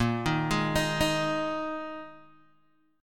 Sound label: Bb 7th Suspended 4th Bb Guitar Open G